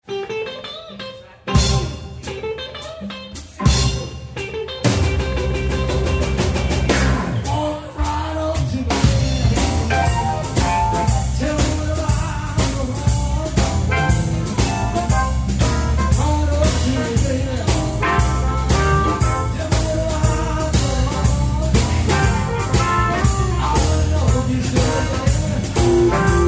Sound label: Blues, live